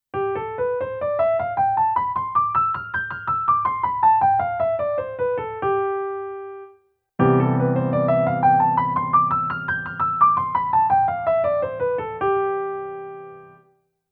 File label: G Mixolydian against Cmaj7